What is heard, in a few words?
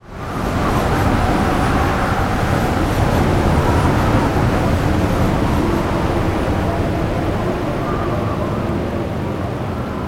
BGM Environment Step; God Eater Resurrection; PlayStation Vita